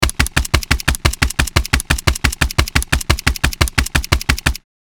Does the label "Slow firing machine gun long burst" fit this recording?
Yes